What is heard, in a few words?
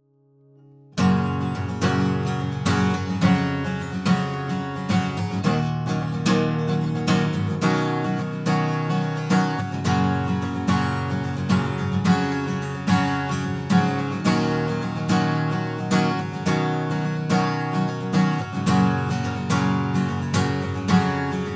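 guitar strum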